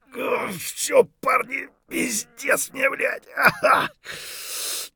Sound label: hurt near death, voice; Escape from Tarkov